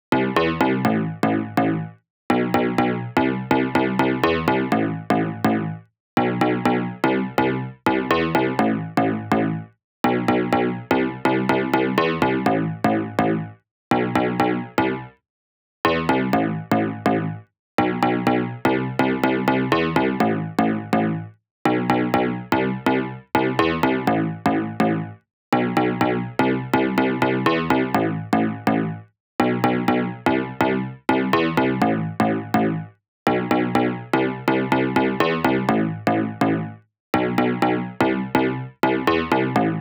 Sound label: Bass DRY NO SC, Future House 124 BPM A; Big EDM; Future House Tools; Construction Kits; Rocking